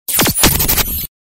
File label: Galery Noise; Sound Ideas - Galery Noise 002